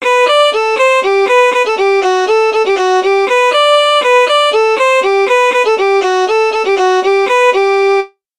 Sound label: polka